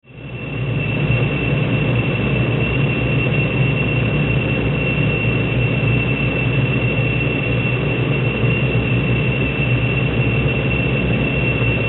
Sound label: Industry Turbine Engine Roar Constant; Factories